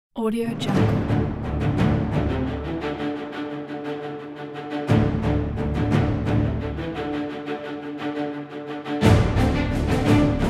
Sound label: heroic adventure crusade